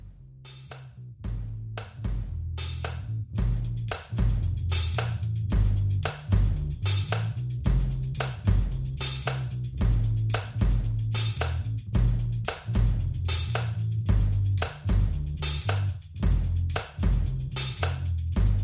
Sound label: multi tamb bass drum